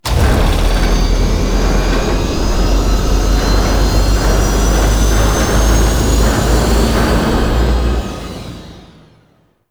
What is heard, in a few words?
Ship Extraction Depart; Vehicles; Warframe; Liset; Liset Flight; Grineer Ship Liset